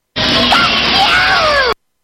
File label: thunderbolt